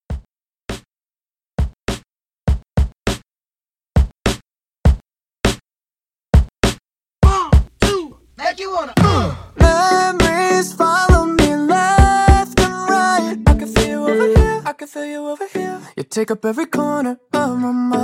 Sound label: Mixshow Re Drum 101